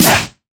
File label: Snr 80S; Zero-G; Soundclash